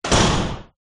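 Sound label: Locker Door Slams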